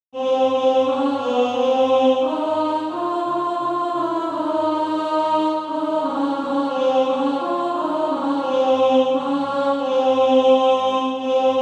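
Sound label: alto, SATB Practise Music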